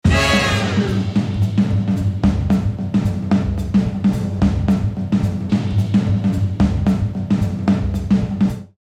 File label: Vintage Big Band Cassette Drum Loop Brass Hit Classic Floor Tom Swing; Groove 4 Bars; Artlist Original